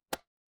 ui toggle

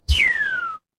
cartoon slide whistle

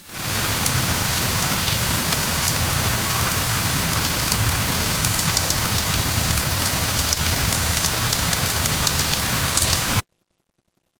ambience rain

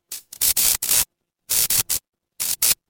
fire electric spark

